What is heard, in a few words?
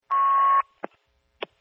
noise